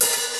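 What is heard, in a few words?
open hi-hat; Crypton - DrumBase5000; RZ1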